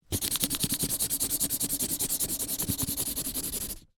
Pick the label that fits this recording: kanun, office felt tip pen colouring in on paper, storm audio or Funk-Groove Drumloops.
office felt tip pen colouring in on paper